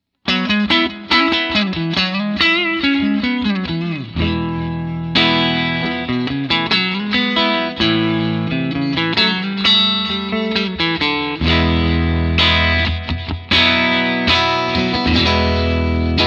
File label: 6v6 strat verb, guitar